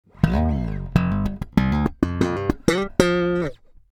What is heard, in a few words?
slap, aria